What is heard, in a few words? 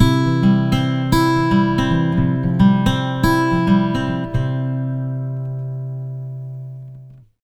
110 MAJ PICK C, Acoustic Guitar Loops; USB Soundscan vol.04 - Electric & Acoustic Guitar Loops; AKAI